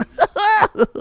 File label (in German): lachen